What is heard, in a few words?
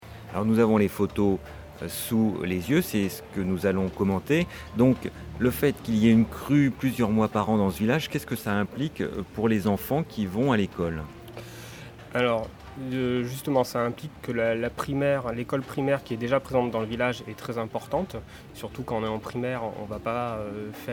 EDM